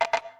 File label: coin; Quentin C's Gameroom